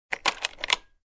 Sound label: Door Chain lock click sound